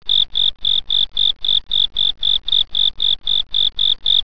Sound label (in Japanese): koorogi